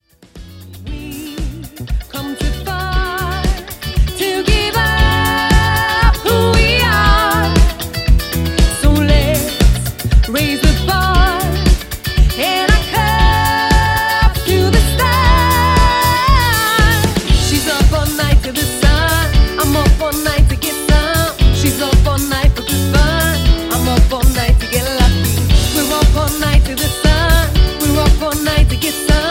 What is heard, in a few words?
super bass